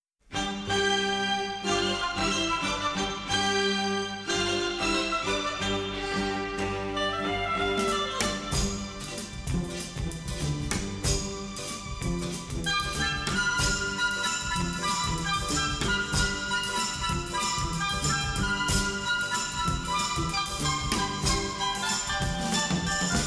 strings

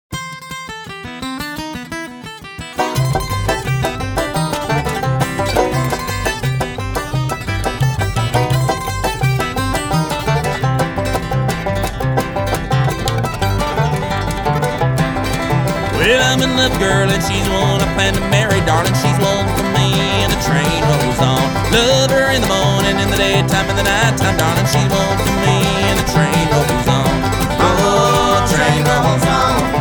train song

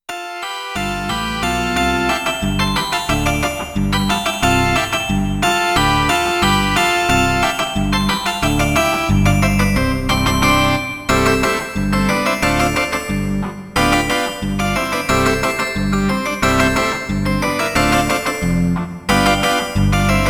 cha cha cha

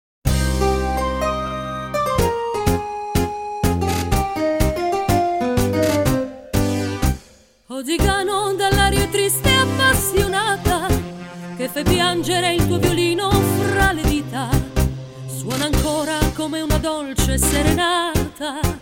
violini tzigano, 30 bpm

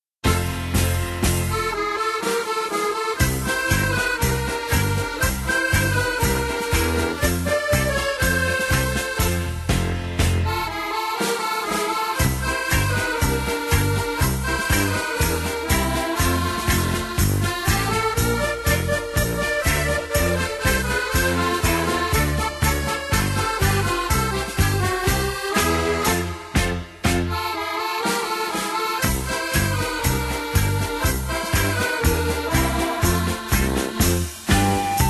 polka, accordeon

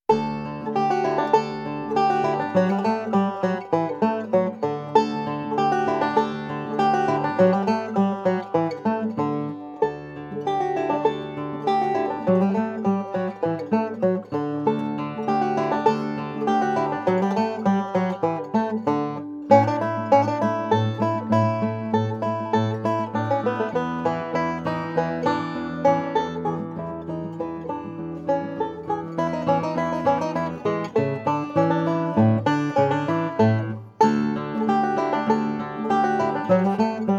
musette in D major, banjo; Notebook for Anna Magdalena; J. S. Bach

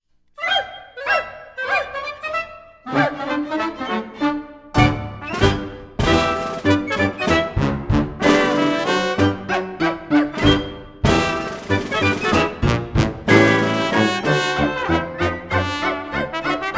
ragtime, rag

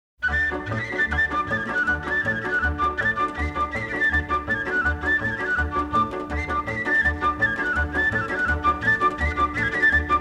folk orchestra, rumanian folk music

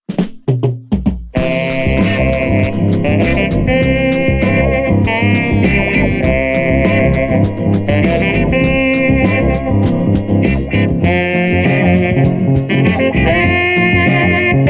sax rock

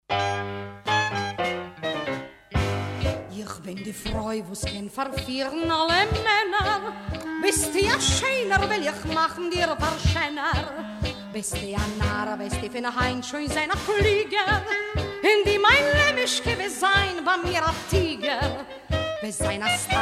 tango apassionate

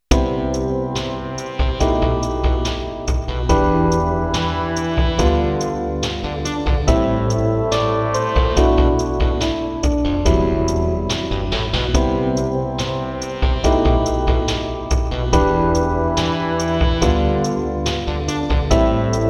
cool lofi hip hop song; Нотный ветер интернета

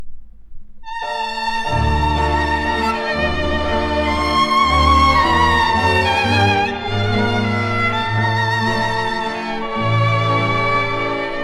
gypsy orchestre tzigane

